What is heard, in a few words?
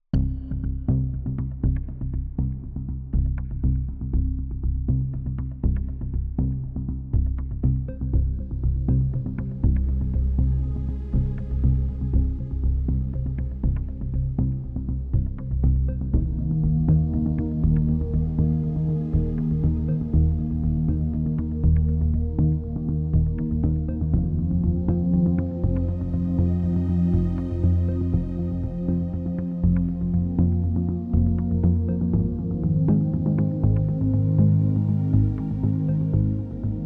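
Deep Tension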